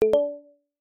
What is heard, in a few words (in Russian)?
tot je lakonichnyi tiktakt uskorennyi